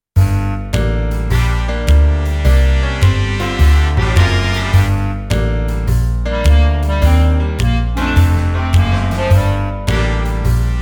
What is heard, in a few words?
Blues